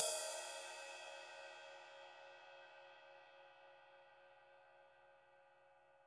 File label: CYM; Bob Clearmountain Drums I